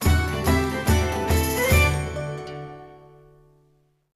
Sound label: lottery win; heroes of order chaos; android ios mobile gamerip 2012